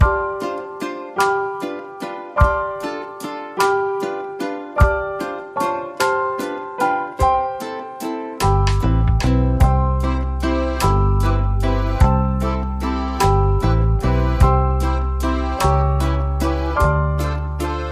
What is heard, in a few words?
Venice Waltz Underscore